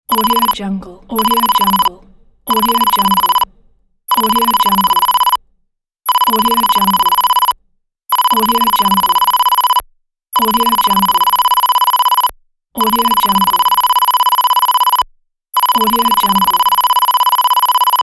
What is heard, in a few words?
computer digital text